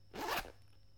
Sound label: zipper